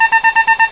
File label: nuke beep